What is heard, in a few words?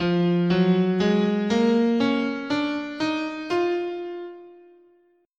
F Symmetrical, scale